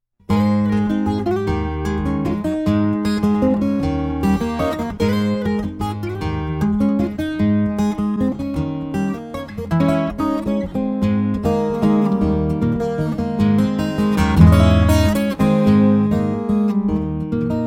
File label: one guitar no vocals